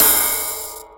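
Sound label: Small Crash, DIGITAL KIT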